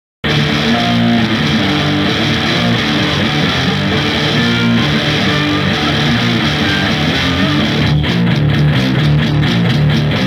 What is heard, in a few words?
guitar; multitrack